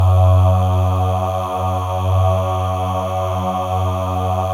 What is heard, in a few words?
MALE AHS, Voices; Spectrasonics